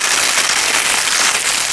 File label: New Ice; XBOX; Amped 2